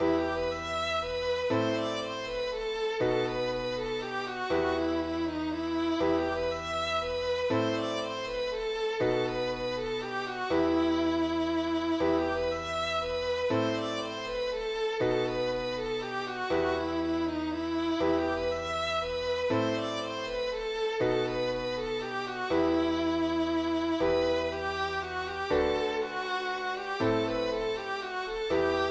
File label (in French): mazurka suedoise